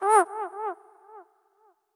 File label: Gigabat Aggro; Going Under